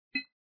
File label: Indestructible Hit; smashing